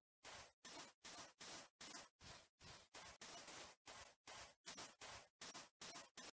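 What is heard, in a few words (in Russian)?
шаги по песку minecraft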